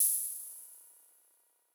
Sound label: CYMBAL, Sound-Master SM-8